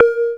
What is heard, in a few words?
A#, Synclav FM PERCUSSION; Big Fish Audio